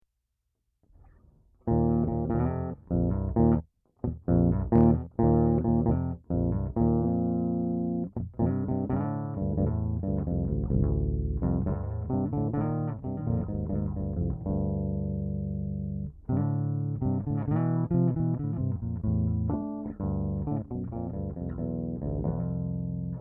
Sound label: harmony 5th 4th, whammy